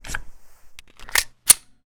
Glock Reload Empty; zps; Weapons; Firearms

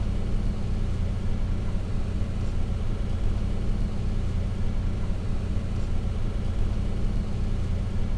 Car idle; zps; Ambient; Vehicles

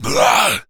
Attack, Zombies; zps; Z Carrier Speech

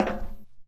metal, Footsteps; zps; Foley

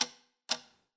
Grandfather clock; zps; Ambient

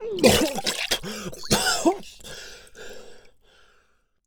Air Rough, Humans; zps; HM Water

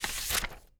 button click release; zps; UI

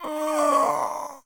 Zombies Male No Use; zps; Male1; No Action

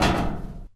metal grate, Footsteps; zps; Foley

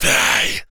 Jump, Zombies; zps; Carrier

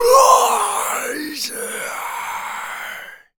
Male Berserk, Zombies; zps; Male1

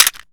Pistol Collision; zps; Foley

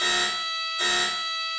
Fire alarm; Ambient; zps; Alarms

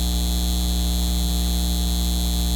Neon Hum; zps; Ambient; Hums

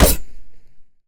Machete Hit; zps; Weapons; Melee

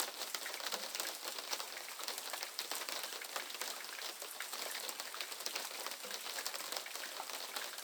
Rain window; zps; Ambient

